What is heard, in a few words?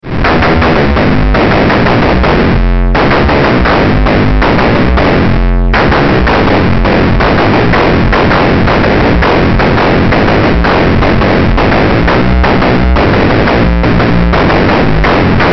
water